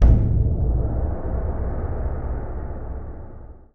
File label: Start Light Tail; Monster Jam Steel Titans